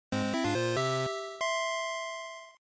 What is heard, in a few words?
GB Racing Clear